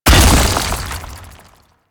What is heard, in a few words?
Infested Bone Blade Spike Burst A; Warframe